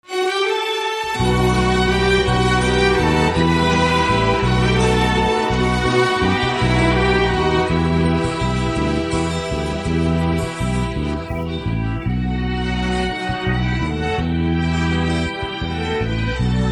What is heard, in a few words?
instrumental, persian